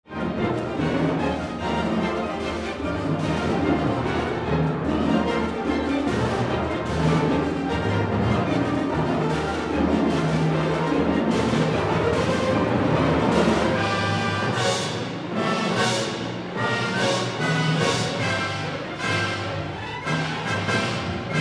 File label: Concerto For Orchestra; Higdon